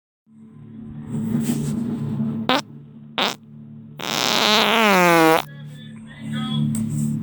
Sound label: Voice, fart